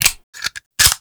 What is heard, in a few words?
Blaster Rifle Reload; Grineer